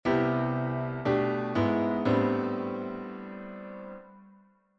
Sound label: I - bvi dim - vi, gospel piano; Par en dessous : bvi diminuée : I-(bvi dim)-vi; L'accord Adim n'est rien d'autre qu'un E7b9 renversé sur le Ab.; Enchainements d'Accords